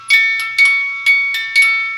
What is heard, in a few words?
Jingle Bells Musicbox